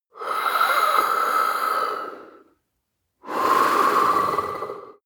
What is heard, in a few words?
breath male hiss